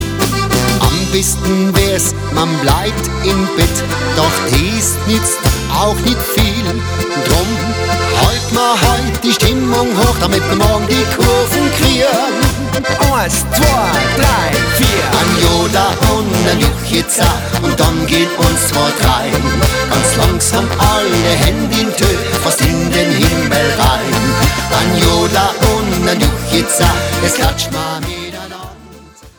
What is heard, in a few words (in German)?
An Jodler und an Juchizer